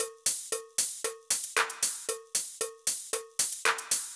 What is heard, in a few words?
Drumloop 115bpm, Nu Disco; Zero-G